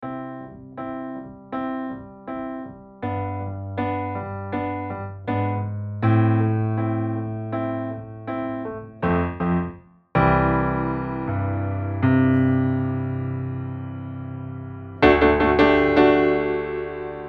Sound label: Bechstein Testsong